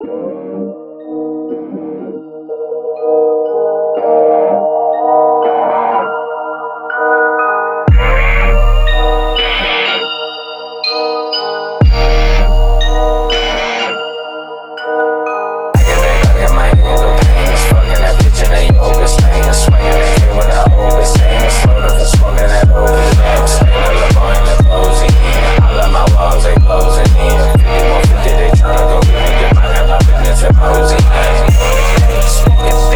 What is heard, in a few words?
Phonk Sample Pack